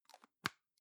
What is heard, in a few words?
plastic can lid screw close soft; objekte; dose